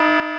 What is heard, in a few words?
CANCEL; Space Bunnies Must Die